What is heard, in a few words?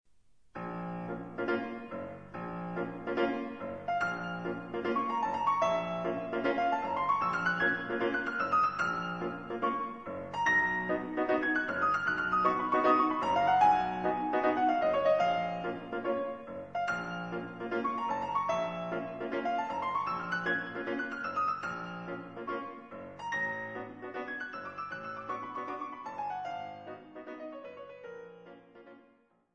sviridov march